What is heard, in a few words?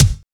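bass drum, real mega drums; Best Service; Real Mega Drums VOL-1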